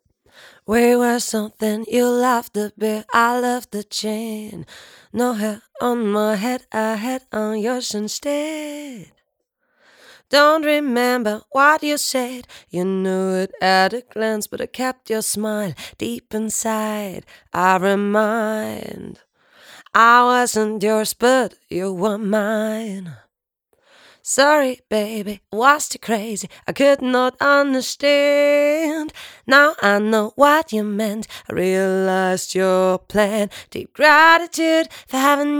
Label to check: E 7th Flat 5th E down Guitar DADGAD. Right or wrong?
Wrong